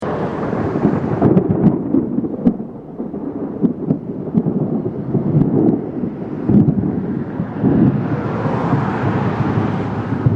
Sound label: thunder